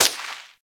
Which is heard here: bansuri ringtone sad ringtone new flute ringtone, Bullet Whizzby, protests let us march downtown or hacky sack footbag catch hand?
Bullet Whizzby